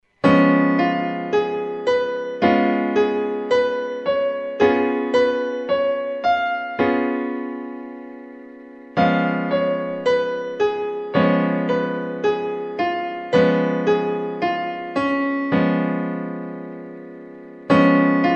Cis7 Db7 Piano Chord

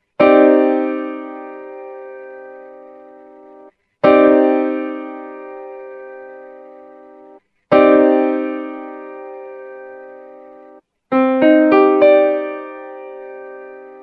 Cmadd9 Piano Chord

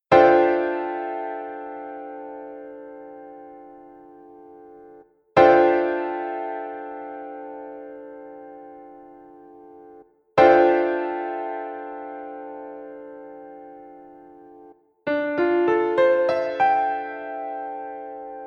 Dm11 Guitar Chord

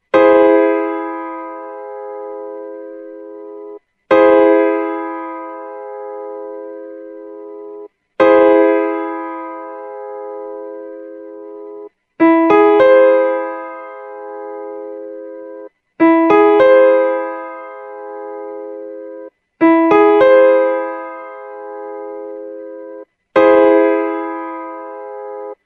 Eaug Piano Chord

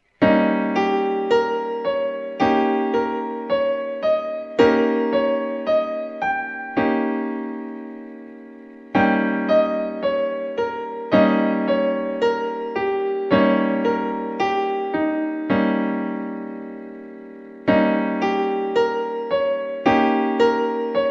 Eb7 Piano Chord

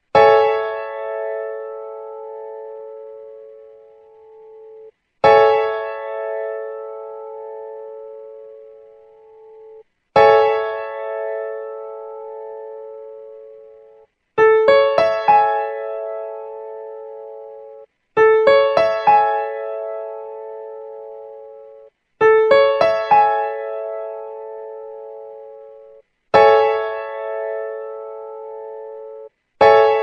Amaj7 Piano Chord

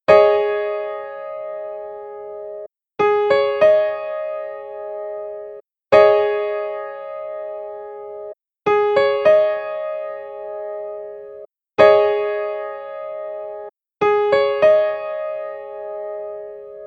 Absus4 Piano chord

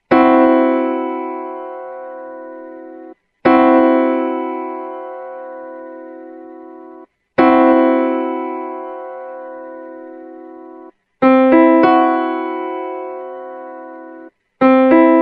Cb5 Piano Chord

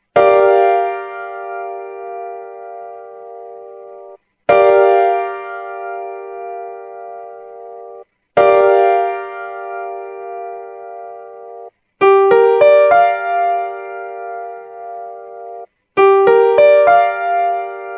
Gm7 Piano Chord

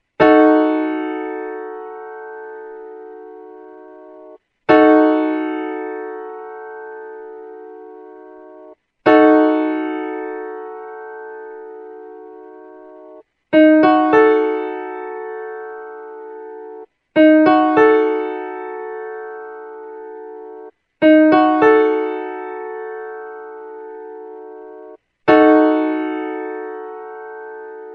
Disdim Ebdim Piano Chord

